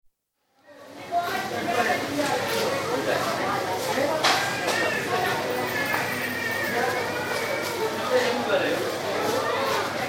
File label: Greece: Fruit market, bustle & chat, also distant radio & traffic, some children, till noise & telephone; Outdoor; BBC